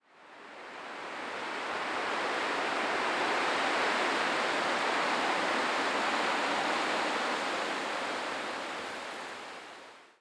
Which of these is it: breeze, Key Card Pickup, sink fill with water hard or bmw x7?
breeze